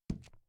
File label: submit